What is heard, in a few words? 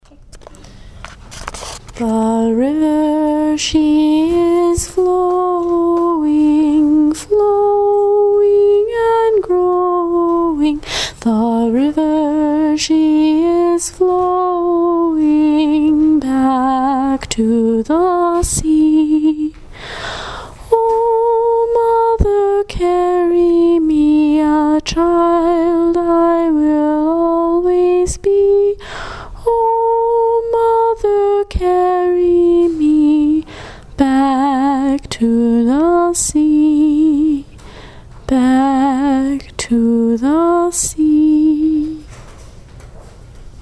Folk Song